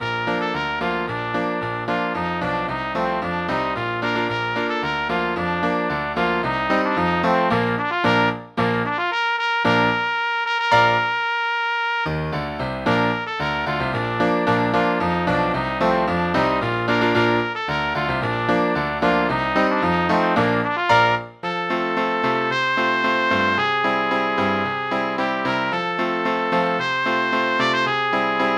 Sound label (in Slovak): polka pre trúbku a klavír; Meninová polka